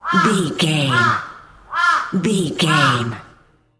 bird jungle crow call caw squawk close

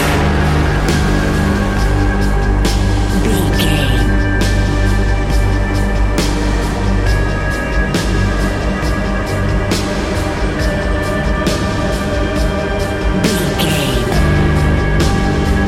suspenseful echoes resonate

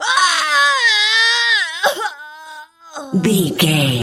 voice female death

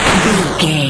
retro explosion general

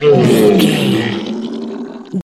troll monster hurt pain long

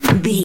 bullet impact wood light

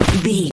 footstep grass land

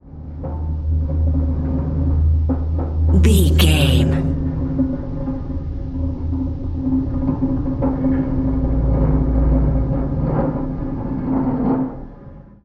metal low creaking ship structure

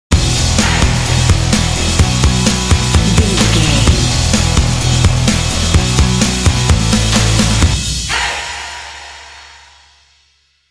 energetic catchy pop sting

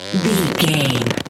foley leather stretch couch chair

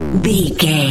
retro misc bass sound

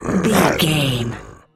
zombie voice general emote

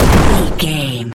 explosion large no tail

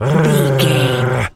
wolf growl

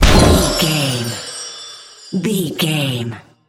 spell harness magic

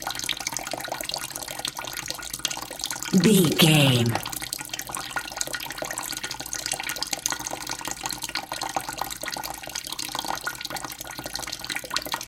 water dripping running loop

